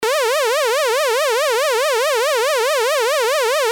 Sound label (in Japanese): LFO 三角波 spd70